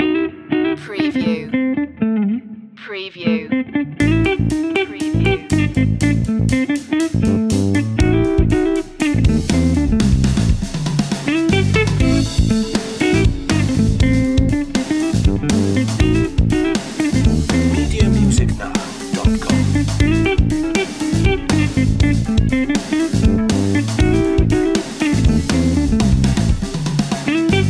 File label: funk soul king; funky music